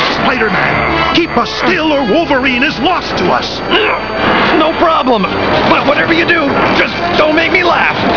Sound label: laugh; Spider-Man:"Ah, ah, ah. Back off Frankenstein!"